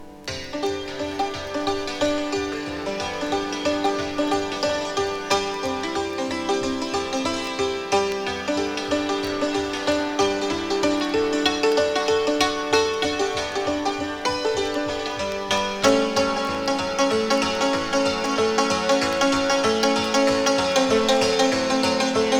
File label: cymbaly